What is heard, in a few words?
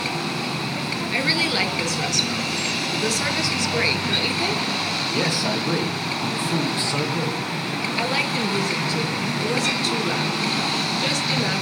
street scene